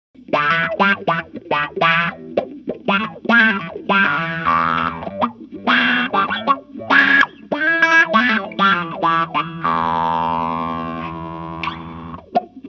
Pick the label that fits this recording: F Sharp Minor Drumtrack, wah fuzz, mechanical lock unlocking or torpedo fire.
wah fuzz